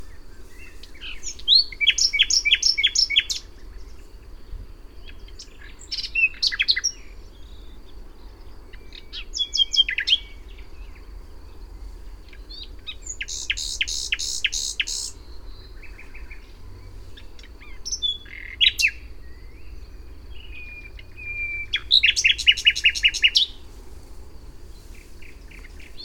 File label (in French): rossignol philomèle Luscinia megarhynchos; sorties nature 2025